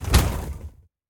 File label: Wood Punch; WoodPunch rr1; SFXBattle